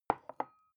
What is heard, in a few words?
glass put down tipping